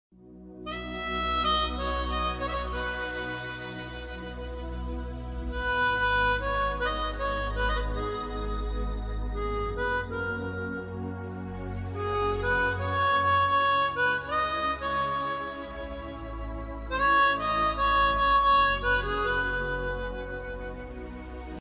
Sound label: flute ringtone